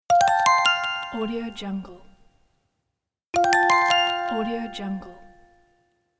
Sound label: message notification